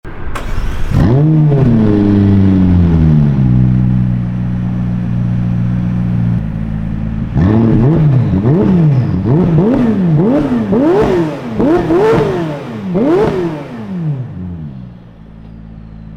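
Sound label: 570s exhaust; performance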